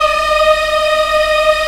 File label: African Mm p; Roland LCDP11 Africa VOL-1